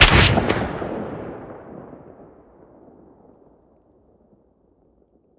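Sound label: weapon sniper sup fire player atmo ext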